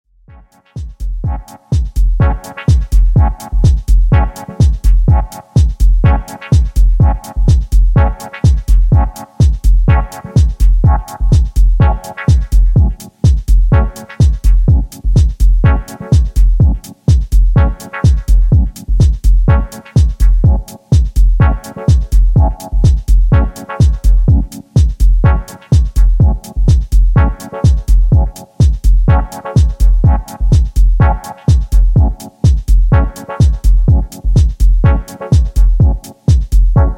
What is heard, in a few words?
dub harmonics